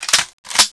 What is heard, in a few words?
reload